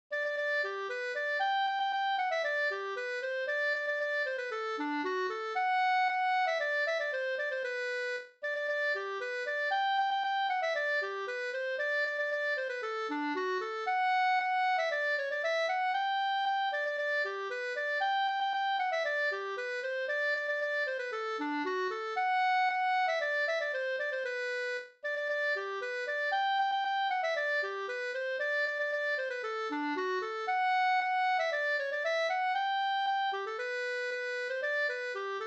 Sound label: polka; Tasjosteppen e Lars Lindstrom An